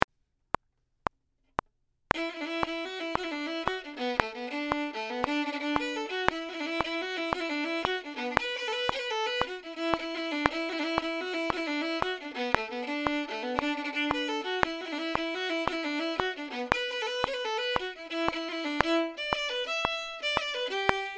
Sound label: Violin; senior audition